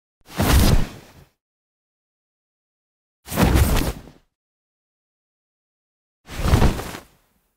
Parachute; چتر نجات

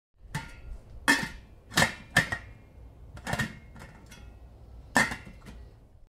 Put pot on stove

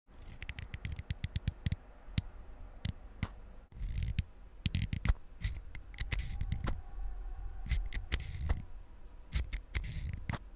gas lighter; فندک گازی

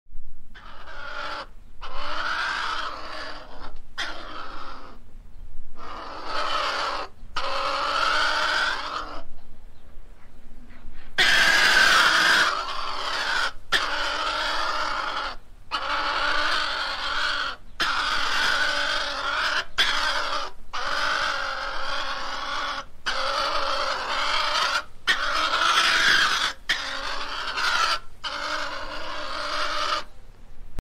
Martens; سمور

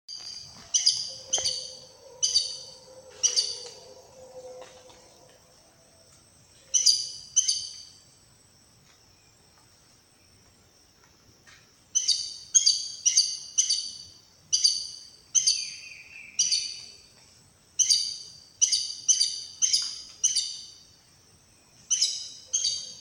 Aracari

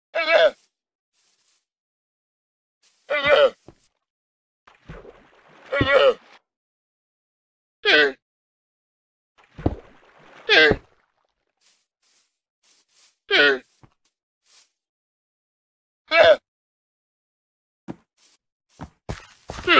Minecraft llama

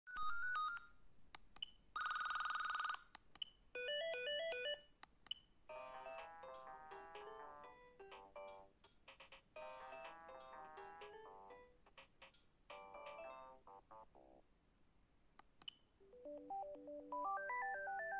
Siemens

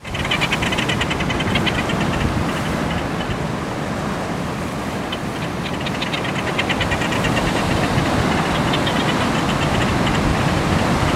Inca tern

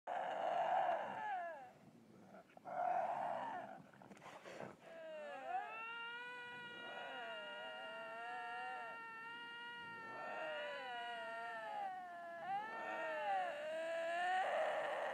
iberian lynx; لینکس ایبری

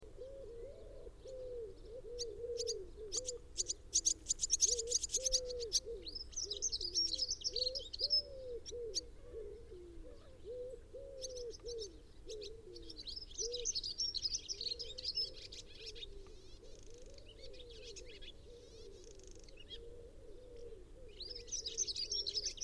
swallow sound

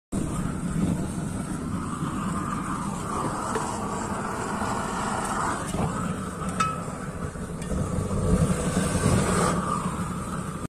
isogaming

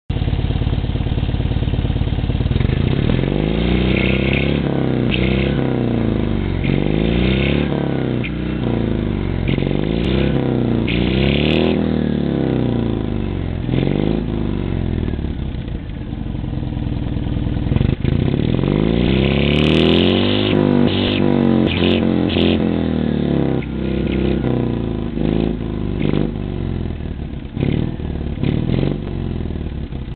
Dyane, اگزوز

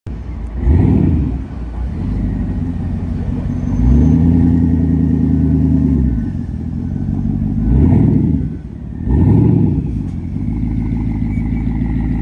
Rimac Nevera; ماشین

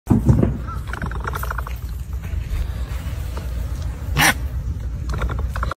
capybara

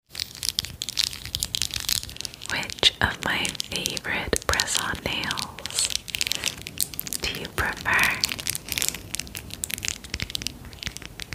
ASMR long nails

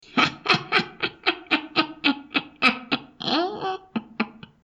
witchy cackle

